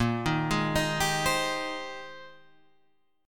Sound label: Bb 13th Suspended 4th; Bb13sus arpeggiated